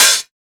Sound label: Open Hat; FLPRO